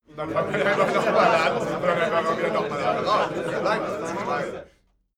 male crowd happy short

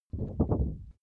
paper wobble long

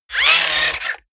robot movement fast short

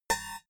typewriter single

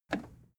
plastic button scratch push disable

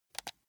console controller option press fast

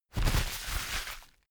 paper towel roll sheet crumple fast

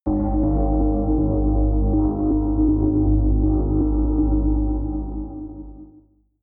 rumble synth

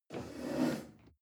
chair wood take slide soft

